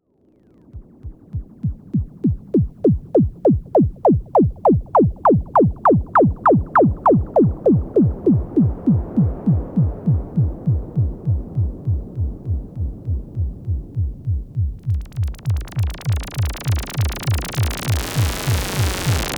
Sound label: PPG Kompakt Synthesizer Noise LFO Resonance